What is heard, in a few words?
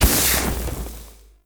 Immolation Extinguish; Warframe; Ember